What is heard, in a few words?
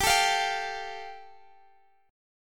G 7th Suspended 2nd